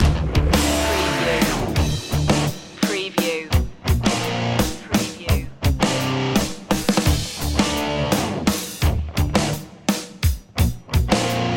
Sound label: 8bit rocktronic underscore